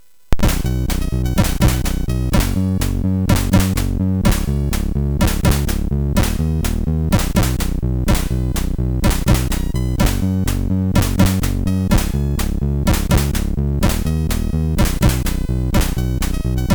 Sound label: Blob, MOS6581R4; hvsc